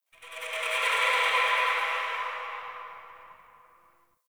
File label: Bat Chatter; Ambience; Misc Outdoor; Warframe; Infested Eris